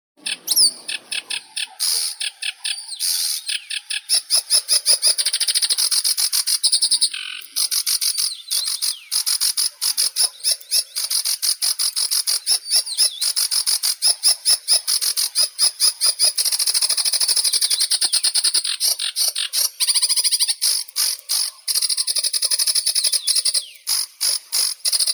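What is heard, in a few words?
sedge warbler, Acrocephalus schoenobaenus; kamishovka barsuchok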